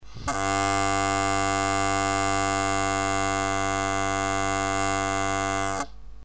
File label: drone, bagpipes